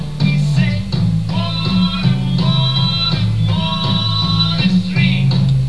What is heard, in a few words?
beat